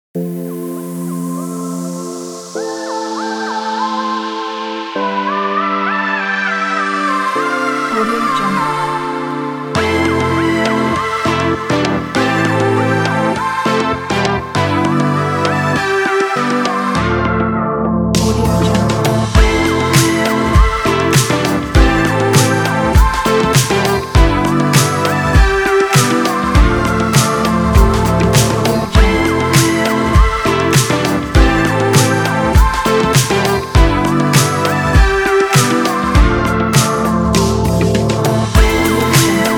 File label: chillout fashion pop